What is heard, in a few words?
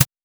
snare